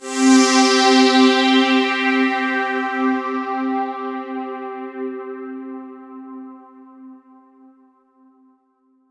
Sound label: C3, Roland JV 2080; MG INTERVAL